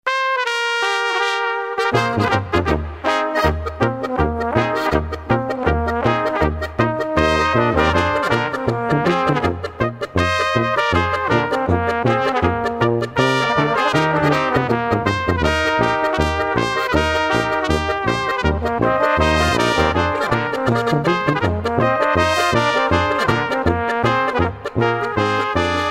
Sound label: polka; volkstuemlich